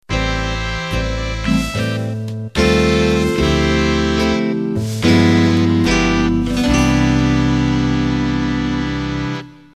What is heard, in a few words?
Slow Jazz End